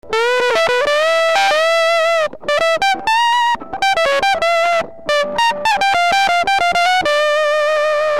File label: Neoctavia, guitar